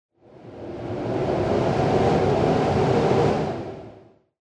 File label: wind; Dystopia; injection